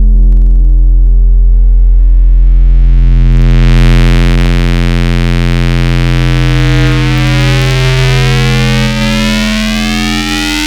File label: Square Squash I; Synthetic's Serum Collection; Cymatics - Nuclear for Xfer Serum Wavetables